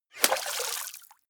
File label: bullet small impact water; krieg-waffen; geschoss